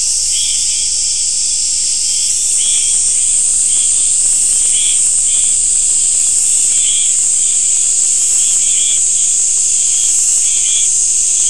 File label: mainly insect cluster